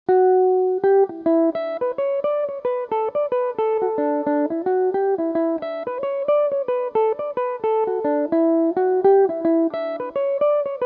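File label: slip jig e dorian, mandolin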